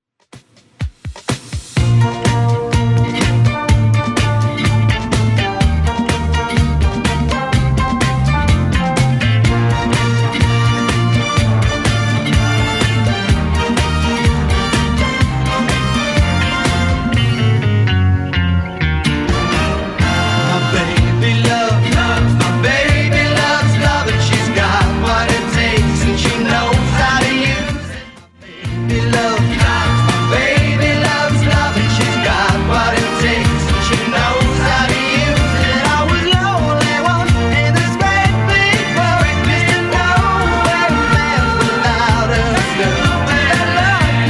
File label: 70s Redrum 125bpm